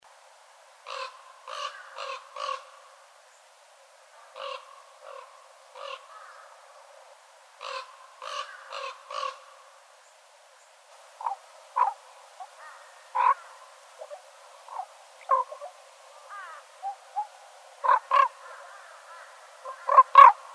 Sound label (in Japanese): watarigarasu, bird